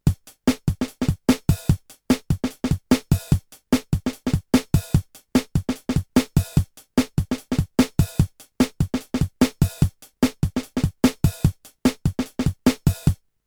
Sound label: Roland TR 626 Preset Pattern